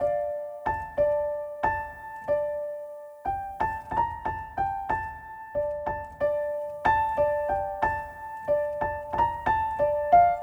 92 Dm piano riff; Ambient Piano Loops; C and Dm Night Mood

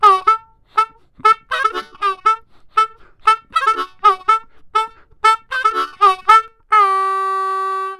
Blues Harmonica A 120

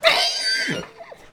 Horse Whinny; animals

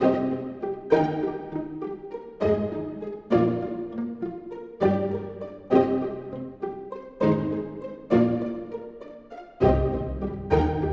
cinematic pizzicato Strings Eb 100bpm

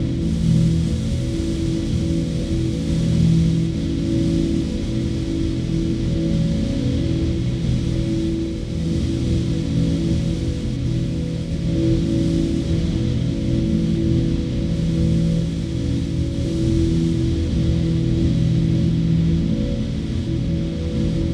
A Synth Grains, Granular Synth Loops; AirPressure; Hydrosphere

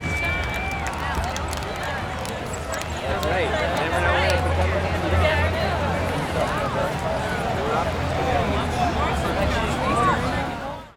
crowds

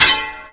bang Metal; tools Bangs

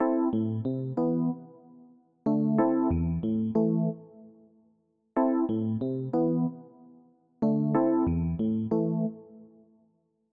A rhodes, Electric Piano; sally; Electric Piano Rhoads; Various Keys

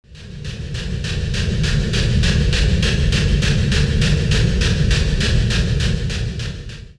steam Engine, trains

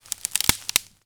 cracking Wood

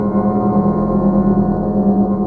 BELL

